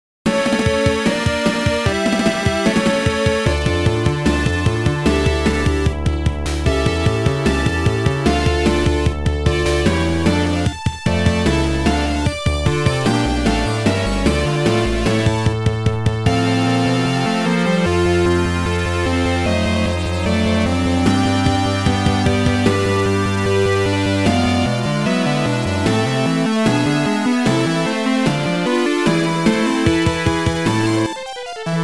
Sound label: Requiem in D Minor K.626 Dies Irae; Garou Densetsu 1 2; Mega Drive; Wolfgang Krauser